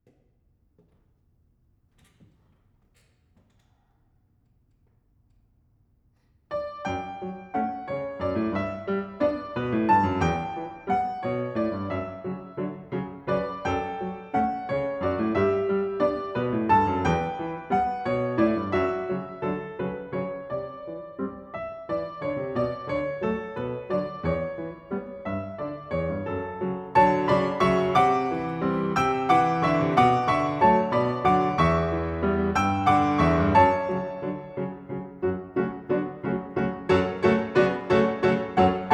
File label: Solo piano